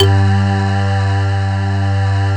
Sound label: BREATH PERC G2